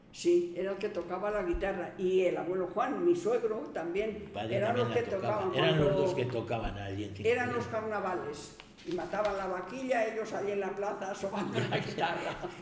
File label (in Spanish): Recuerdos guitarra Carnaval; Cinco Villas